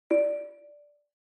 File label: OS Sound (Speaker-Notification)